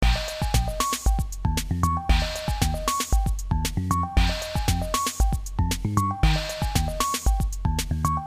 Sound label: tone